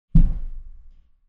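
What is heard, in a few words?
something dropped on the floor from above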